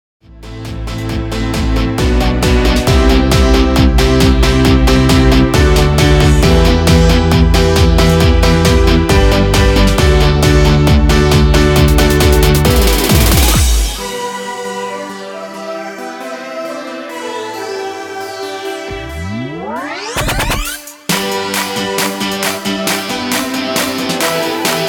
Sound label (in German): Chöre G-DUR ohne CHOR